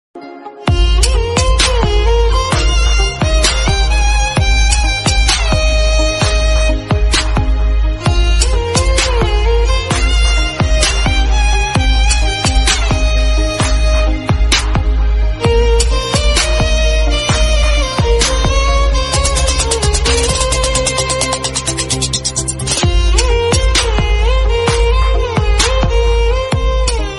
love bgm ringtone south indian bgm ringtone tamil ringtone